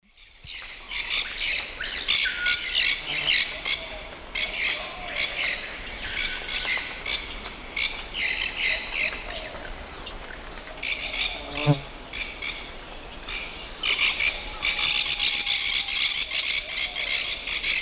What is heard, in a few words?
Pyrrhura melanura, contact and flight calls; S. America; Eastern Lowlands